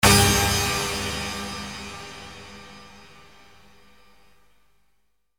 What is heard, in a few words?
pitch fx